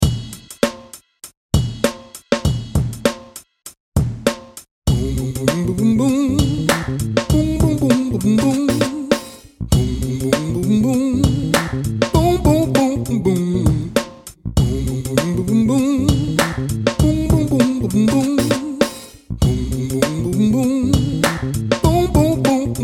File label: boom boom